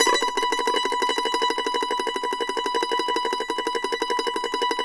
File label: MANDO TREM B5, Whole Lotta Country; Sampleheads; Whole Lotta Country 1 & 2